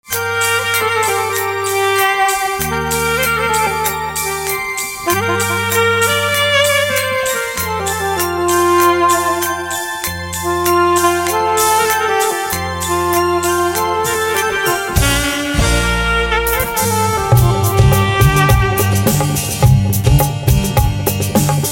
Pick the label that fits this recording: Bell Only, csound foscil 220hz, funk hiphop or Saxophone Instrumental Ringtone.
Saxophone Instrumental Ringtone